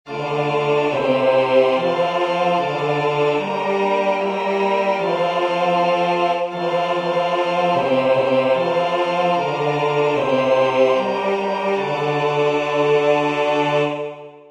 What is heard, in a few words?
Agnus Dei bass; singing divine service iii in parts